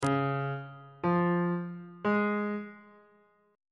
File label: a sharp aeolian chord 3 is c sharp major on bass clef